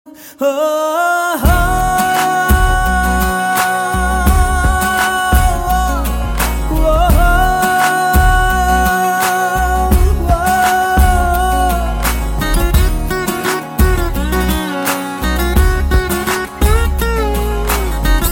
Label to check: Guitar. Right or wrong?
Right